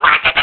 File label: Burn; Link getting burned